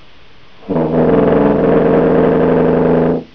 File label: tuba